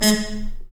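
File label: brass trumpet mute stab, Harmon stabs; Harmon Amb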